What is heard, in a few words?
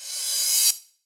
Reversal Cymbal